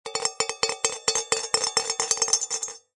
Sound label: Cartoon Drum Cymbal Tap, Metal parts moving; Comedy/Cartoon; Funny Sounds; Lynne Music